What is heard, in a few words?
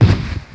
mortar